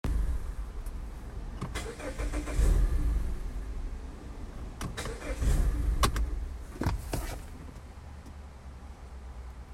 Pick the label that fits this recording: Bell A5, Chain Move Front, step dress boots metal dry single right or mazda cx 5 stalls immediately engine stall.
mazda cx 5 stalls immediately engine stall